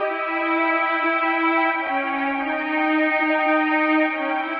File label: strings, Loops, Sad